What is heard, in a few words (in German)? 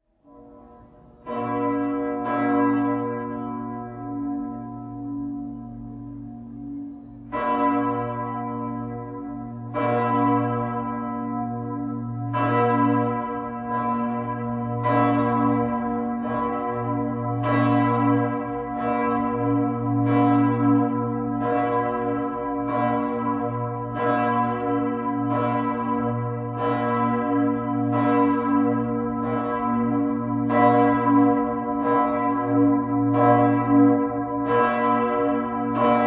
Glocke h, Domglocken; Paulus